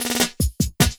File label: LOOP SD; Ueberschall; Drum & Bass Resonance